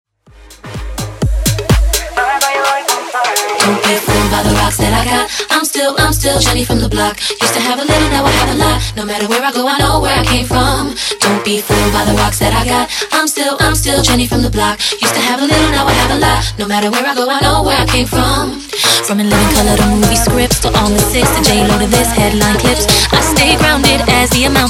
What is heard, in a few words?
Bass House 126bpm